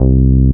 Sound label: M-Audio Venom Taurean C2; Bass - Synth